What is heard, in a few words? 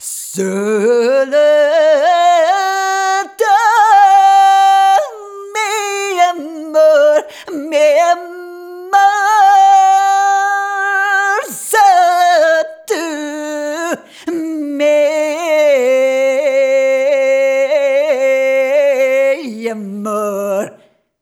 Rhythmic G